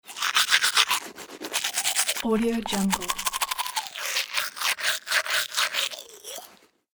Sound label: brush teeth